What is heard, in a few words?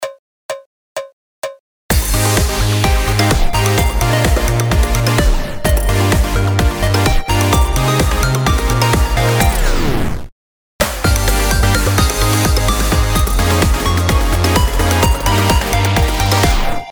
Tape Stop